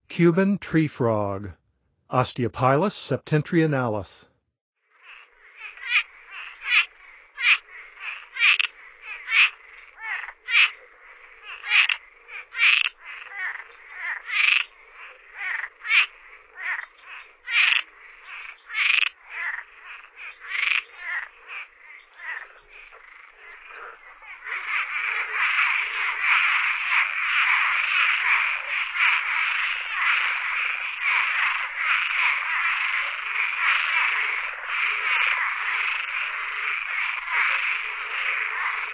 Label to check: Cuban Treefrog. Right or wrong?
Right